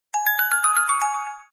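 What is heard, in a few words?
xylophone sound of xylophone; ksilofon-zvuk-ksilofona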